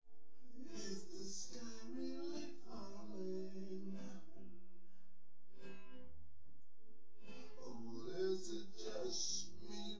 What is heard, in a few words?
nodrums synthconv